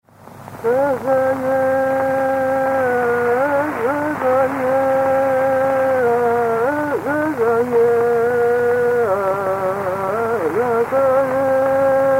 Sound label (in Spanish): Canto de mujer. Quilay Quina Neuquén Argentina; Música Mapuche